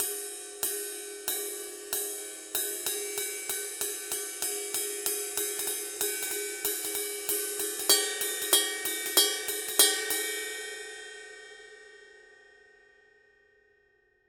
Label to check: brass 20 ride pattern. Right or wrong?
Right